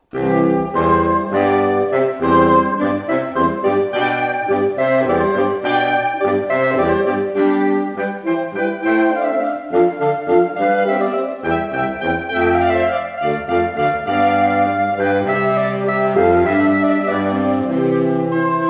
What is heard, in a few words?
Saxophone Quartet; WaterMusic